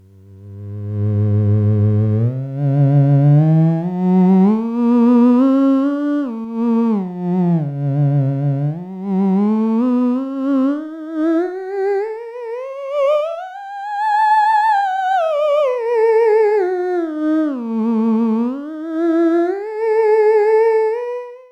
tube ss voice, theremin

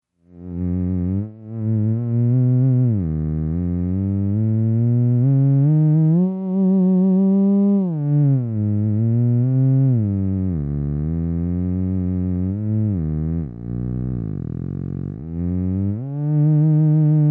low end, theremin

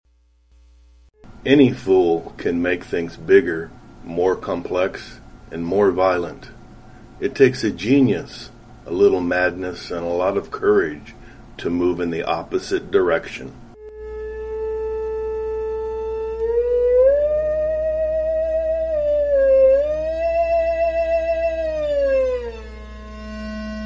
6 octaves, theremin

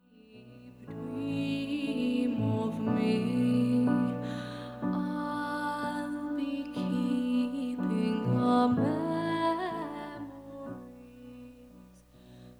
pwm volume control, theremin; ews buffer exciter

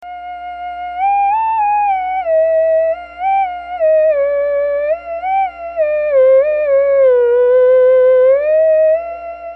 native american, theremin

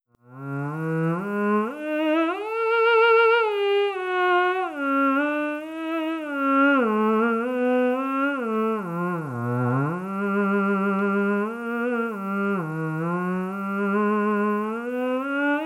ews acoustic, theremin

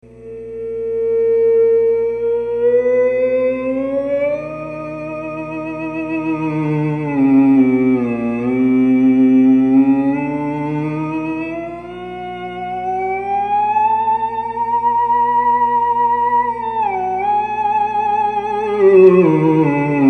song, theremin

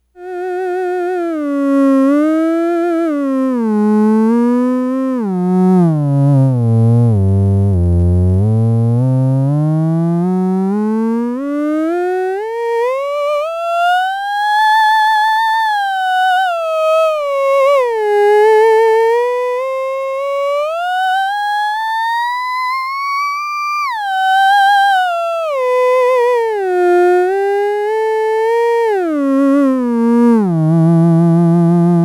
ews new board, theremin; ews buffer exciter

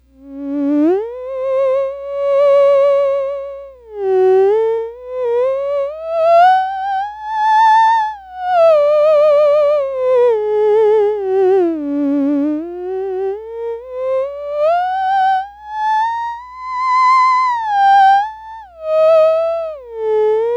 theremin

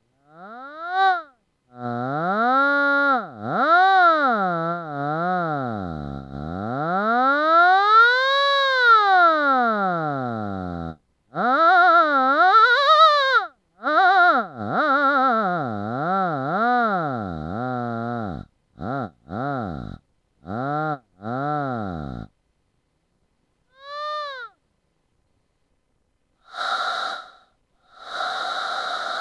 male, theremin

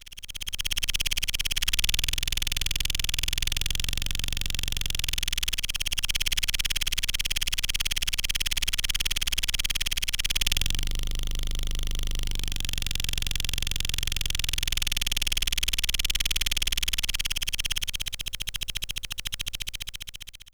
cicada new board, theremin; ews buffer exciter

